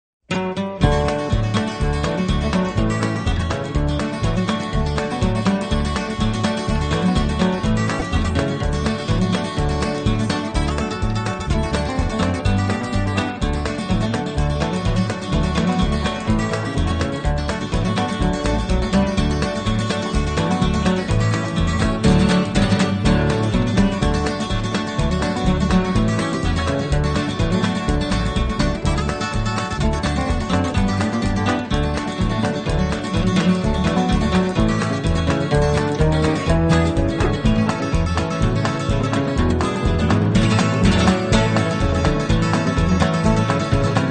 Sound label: Guitar, Old Country Music